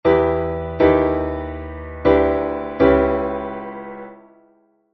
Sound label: VIm VImM7 VIm7 IVm7b5